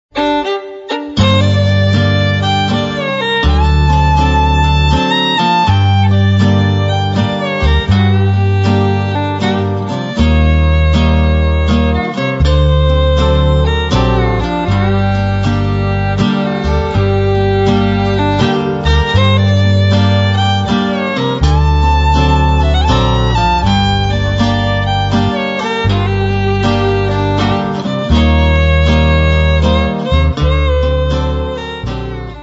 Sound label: Gypsy Waltz